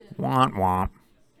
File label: wamp wamp